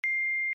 Workout Precision Start Haptic, iOS Sounds

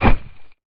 tile fall; Golden Crayon Sounds

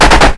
machine gun hit shelter ext; Original TK Sounds

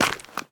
ice step; Golden Crayon Sounds

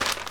paper step; Golden Crayon Sounds

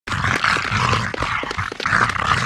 hog noise; Original TK Sounds

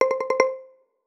3rd party critical; iOS 27

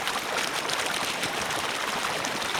fountain; Golden Crayon Sounds

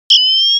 Siren Countdown Major Haptic; iOS Sounds

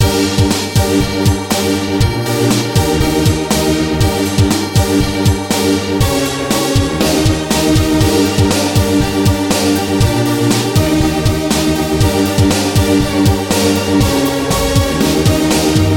background; RS Games Sounds; Roulette

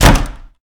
wall wood; Golden Crayon Sounds

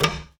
steel fall; Golden Crayon Sounds

